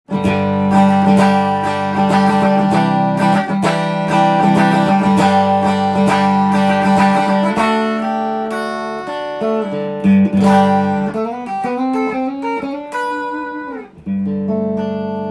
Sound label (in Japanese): fender mekishico